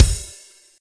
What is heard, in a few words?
kick soft hihat open